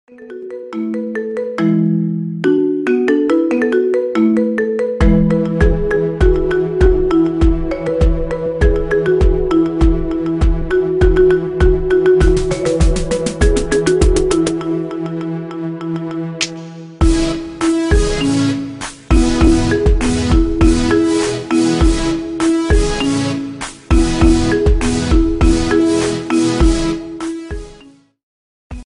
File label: Marimba Remix